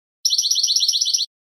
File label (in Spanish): bibleos golpeados finos; campero